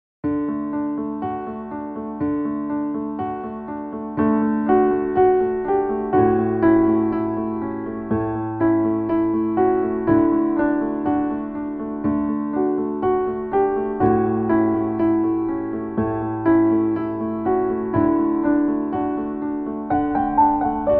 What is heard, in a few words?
Hush Little Baby Karaoke